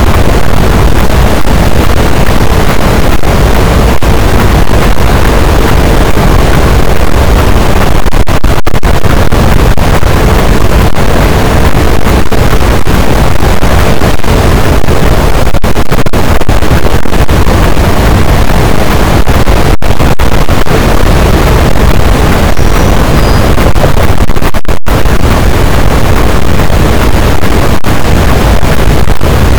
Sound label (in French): cachalot, boat; Physeter Macrocephalus